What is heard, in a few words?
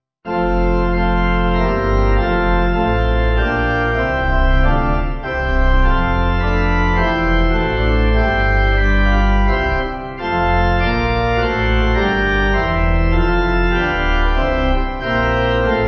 Pipe